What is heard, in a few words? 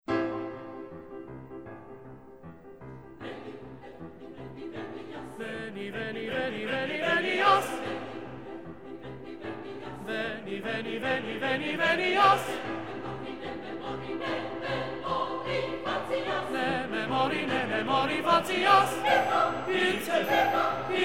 Tenor 1; Veni veni venias; Coro II